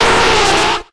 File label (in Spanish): Grito de Nidoqueen